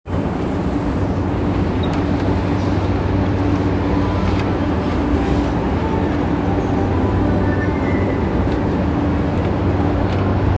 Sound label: train sounds; 南北線六本木一丁目南